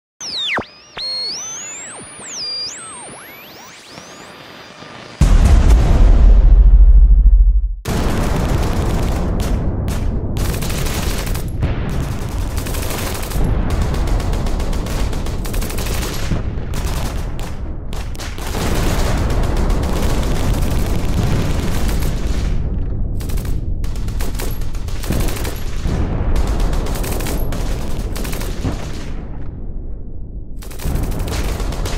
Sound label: Battle war sound effects. Bombs, guns and explosion noises.